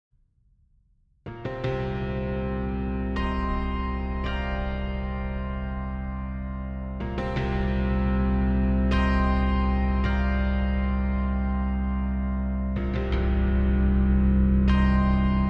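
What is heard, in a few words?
CP80 Reso, Pianoteq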